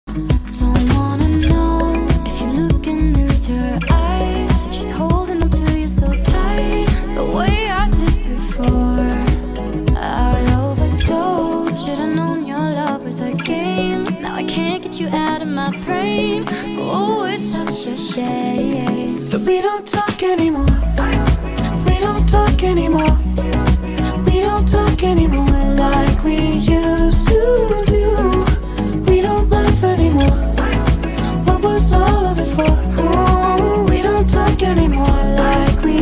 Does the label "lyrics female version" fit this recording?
Yes